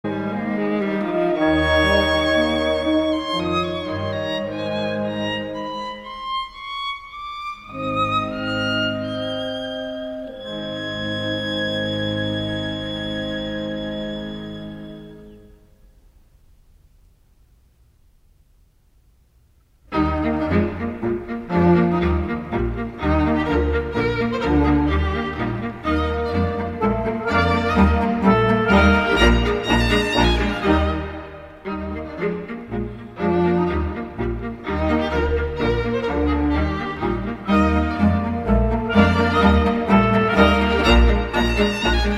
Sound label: Septet in E-flat Major (strings & winds); Beethoven, Ludwig Van; Opus 20